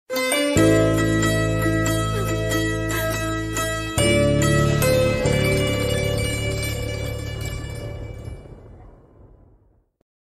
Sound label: love bgm; ayan